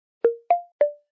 Notification

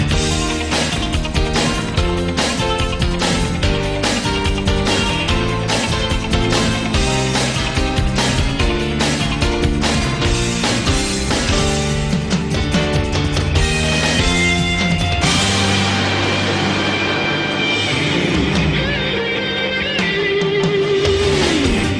Pokemon Intro Instr

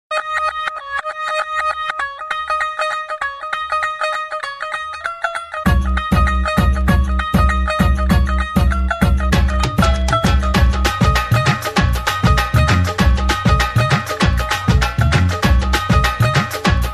punjabi tone; dance

